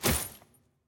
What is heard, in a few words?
Item Buy